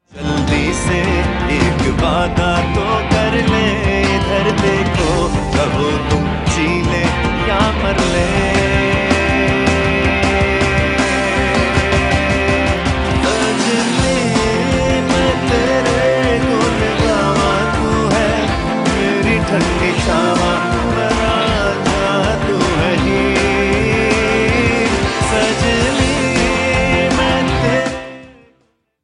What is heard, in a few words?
strings